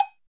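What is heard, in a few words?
LATIN COWBELL; COWBELLS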